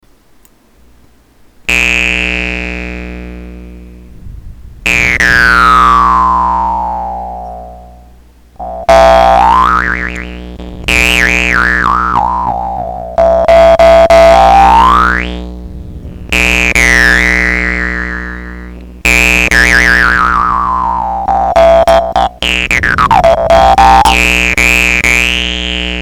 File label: eagle owl